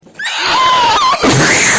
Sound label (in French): truie